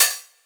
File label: Open Hihat; Best Service; House Definition VOL-1; Cymbal Stuff